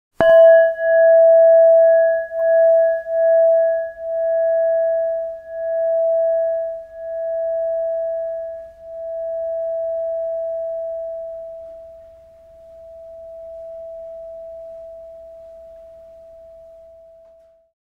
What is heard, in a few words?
Spin gong Small